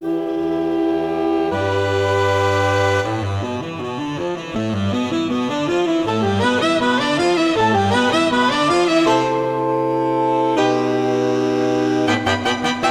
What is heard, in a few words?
Saxomaphones